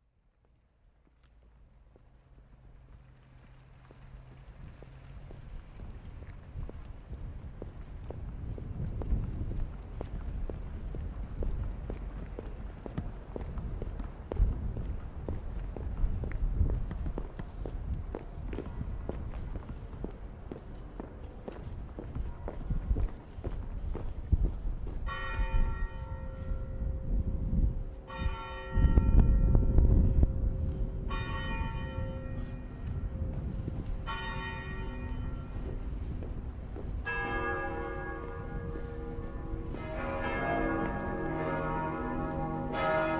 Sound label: church bells and organ